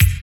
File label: KIK TAMB, Dance Kicks; Roland L-CDX-01